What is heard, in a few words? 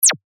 Laser, percussion; revealed percussion vol 3